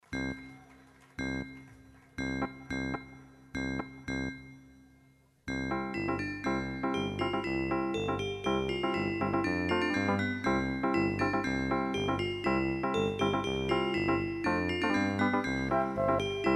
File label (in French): La Demo Du Synthe